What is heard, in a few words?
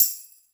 reverb high hat sample g key, hat one shot tuned to the G note. Loudest frequency: 10707Hz; tuned drums